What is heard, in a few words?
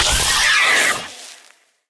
hurt, phantom; mob; Minecraft; Votive World